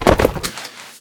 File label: alpha land; Apotheorasis